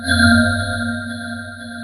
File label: order complete; orders; Star Ruler